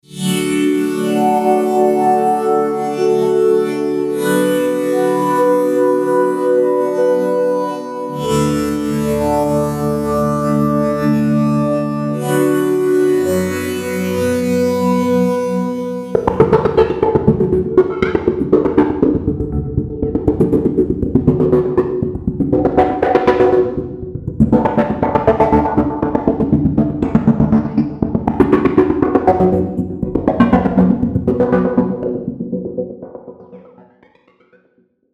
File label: Phat Step Pad; Logic